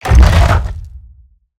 Roll Surface Start; Evolve; Behemoth

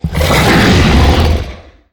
Sniff; Evolve; Behemoth

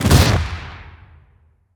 Melee Impact Base; Grounder; Evolve; Kraken

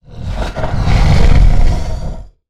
Exertion Light Breaths; Evolve; Goliath

